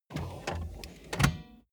car bmw e46 interior seat pull forward short

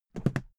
briefcase close soft; industrie wirtschaft; bueroarbeit

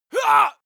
male attack groan; schreie; menschen

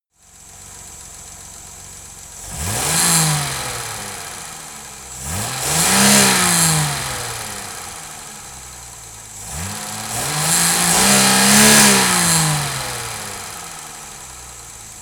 car jaguar e-type rev engine

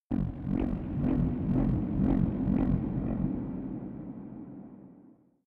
space alien scavenger ship; raumschiff

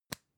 lipstick open soft; haus-garten; badezimmer

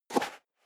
step high heels sand single right

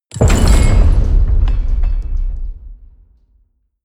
door slam large

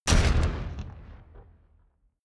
flutter echo; whooshes rises; hits impacts

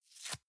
box small tear apart soft

